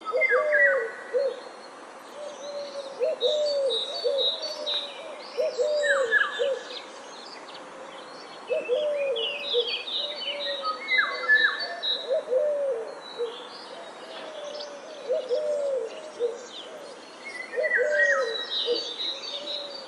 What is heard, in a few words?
bird sound, shifting